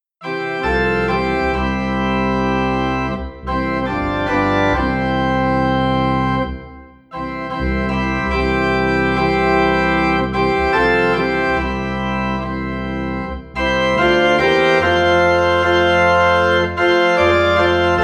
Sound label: Organ in C